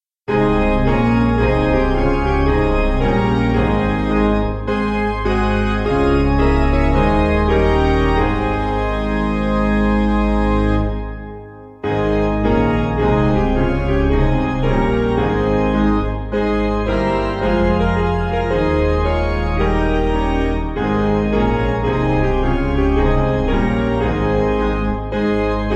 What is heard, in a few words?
Piano